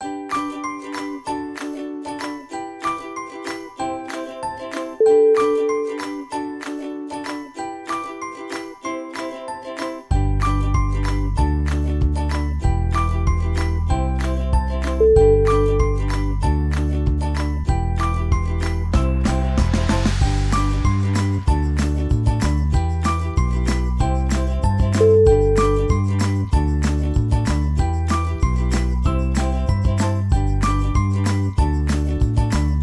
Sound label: ukulele theme